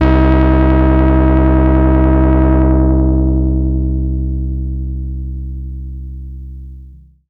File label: SYNTH